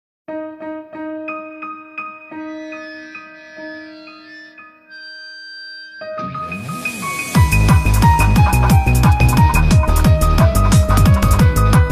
Nu Rave

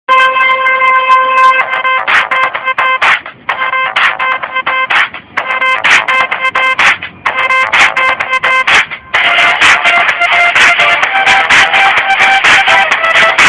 techno

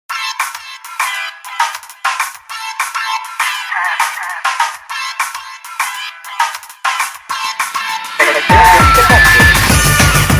Retro Mix

